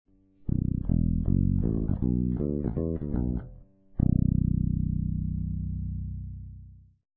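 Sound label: bridge pickup finger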